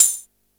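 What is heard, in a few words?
tambourine, Yamaha RY-8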